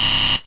laser; Monster girl quest Part1,2,3; Visual Novels

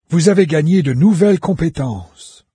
levelup; Fate The Traitor Soul

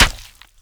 light Flesh; Fate Undiscovered Realms

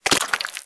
slime; Monster girl quest

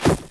miss; Monster girl quest Part1,2,3; Visual Novels

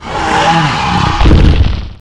dragon die; Fate The Cursed King; MONSTERS

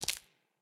footsteps hive; Fate The Cursed King

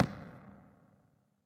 footsteps barrel; Fate The Cursed King

